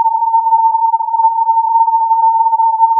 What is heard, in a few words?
filtered input